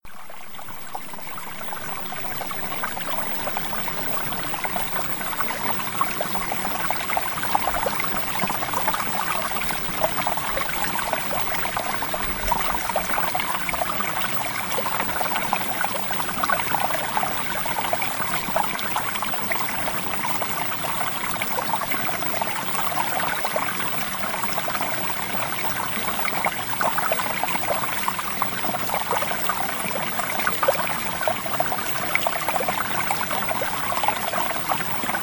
Water; EMOTIONAL HEALING; Premium Subliminals; Emotional Mastery

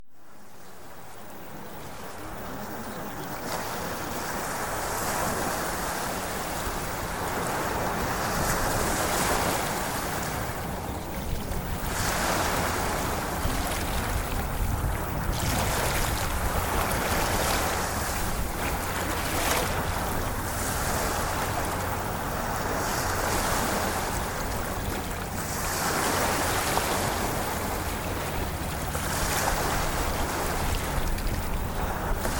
Ocean Waves; Premium Subliminals

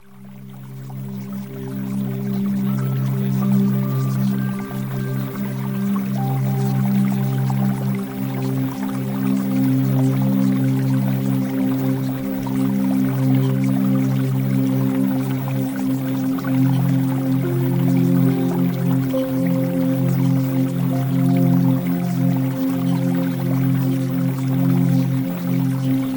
Music

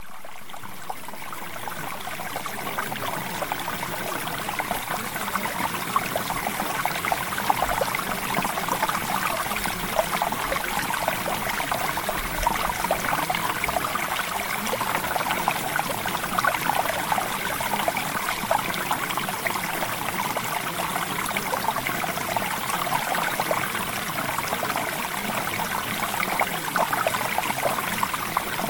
Water Sample

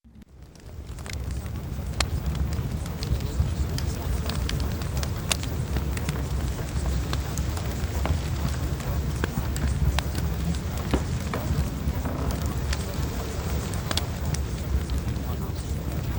Fireplace

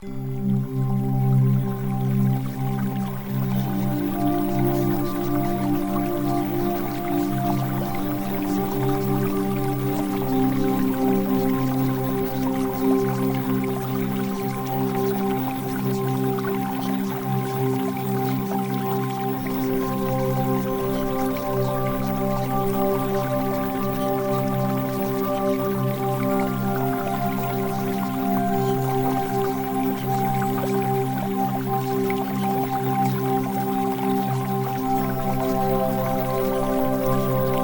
Music; Premium Subliminals

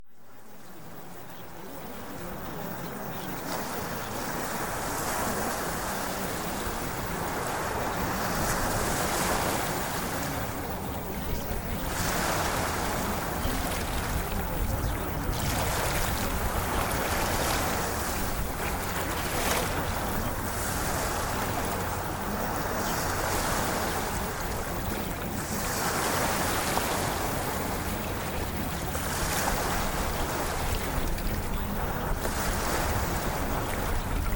Ocean Waves; Premium Subliminals

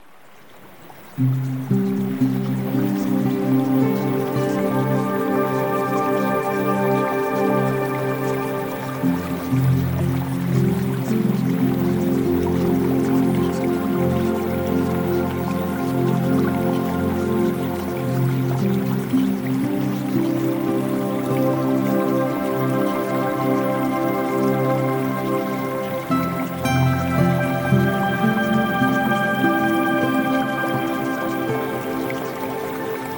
Music; Premium Subliminals

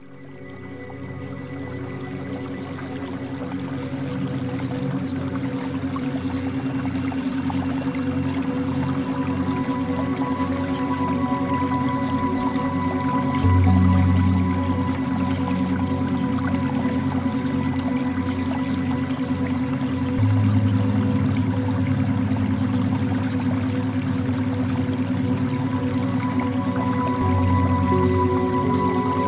Music

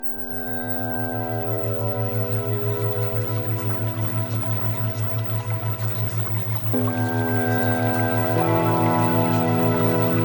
Music Theta Waves

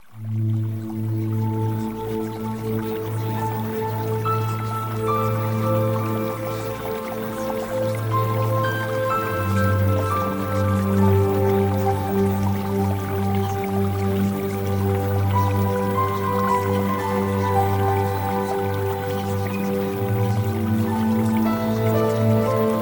Music

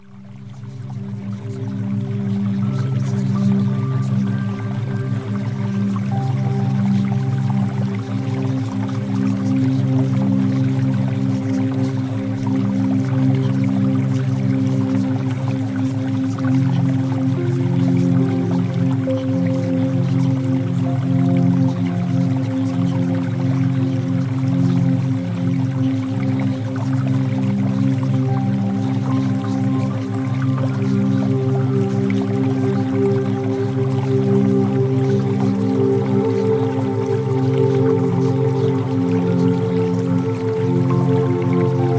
Music Theta Waves

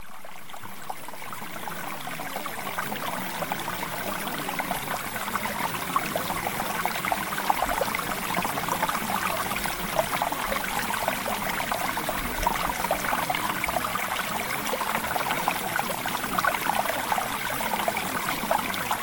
Mountain Stream

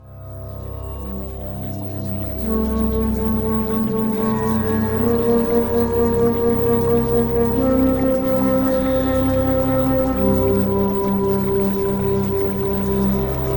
Music; Premium Subliminals

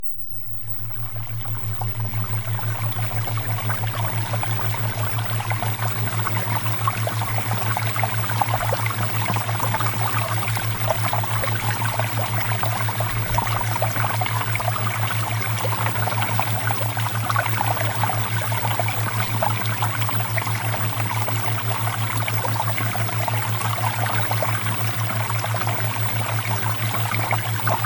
Water Theta Waves

